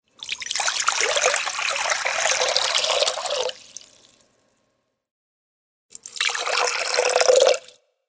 Pouring Liquid, Pouring liquid out of a container.; Pouring (Sound Effects:Objects)